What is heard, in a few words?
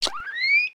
Rat Hatchling Squeek; creatures organic